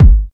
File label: dry steel kick drum g key, Royality free kick drum one shot tuned to the G note